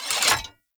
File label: Sword And Board Un Equip; Weapons; Player; Swords; Sphere Sword And Board